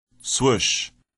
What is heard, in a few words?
swoosh